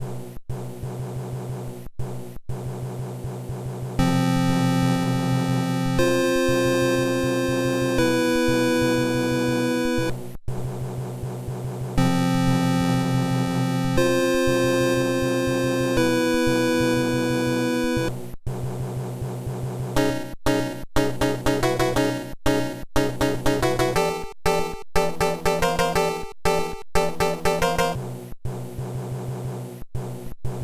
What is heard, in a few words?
BGM Airship Play Hurry; Super Mario Maker